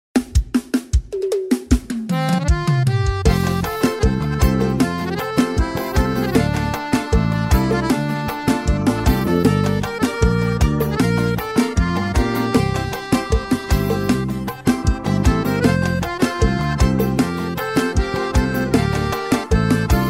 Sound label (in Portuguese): Playback Versao Forro; forro cristao sax alto eb